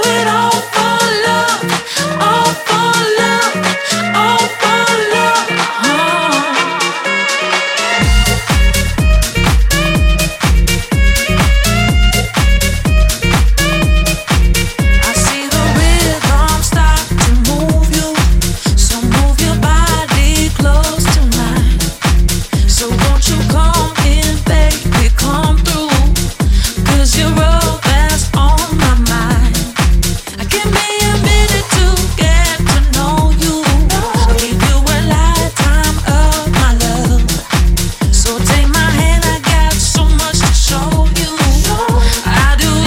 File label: sax